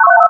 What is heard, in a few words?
accelerator charged, weapons